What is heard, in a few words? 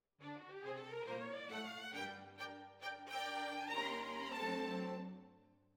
Violins Over